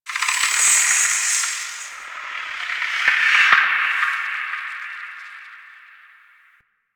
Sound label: Misc Creepy; Ambience